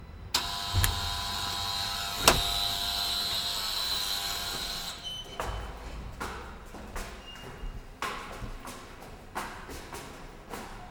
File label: Apartment Entrance Someone Buzzed In Door Open Hallway Steps Echo